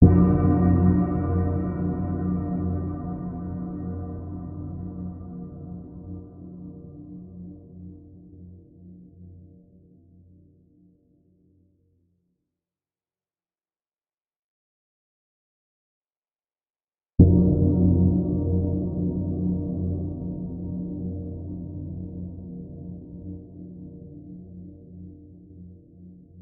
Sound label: A Creepy Bell Shaped Dirty Hit Designed